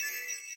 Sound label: crystal blink loop; elements